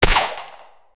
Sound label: realistic 9mm pistol sing